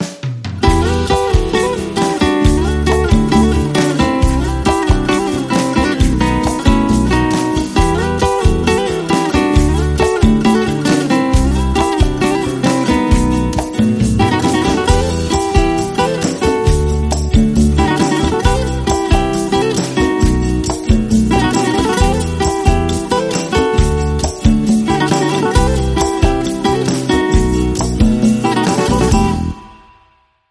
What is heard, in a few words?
Spanish Guitar